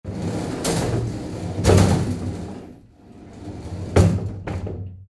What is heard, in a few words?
door sliding close